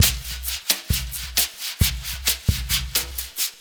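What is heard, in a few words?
Bossa Nova Drums 133, Lounge Drumz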